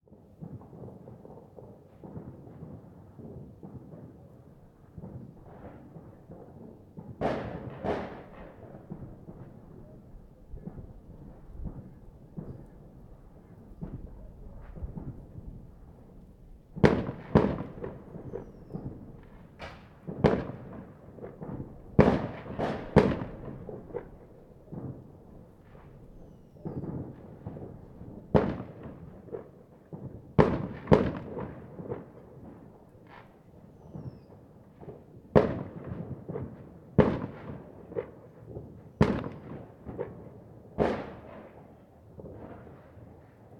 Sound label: fireworks; NS Studios - ultra sound collection